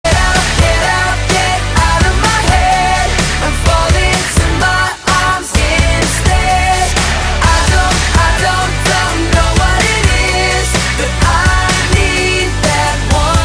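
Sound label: ringtone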